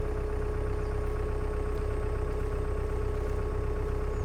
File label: machine noise